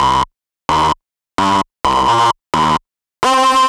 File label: Geetar Stack, Underground UK House & Electro, Synths; Sonic Foundry (Sony Creative Software)